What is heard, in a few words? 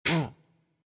Pain hit bear